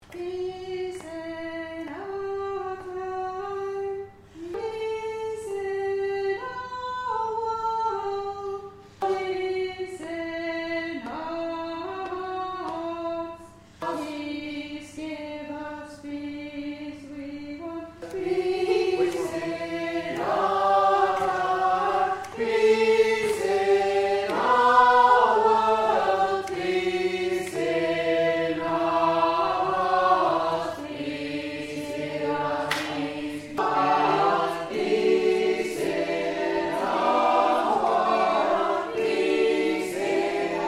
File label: We Want Peace Round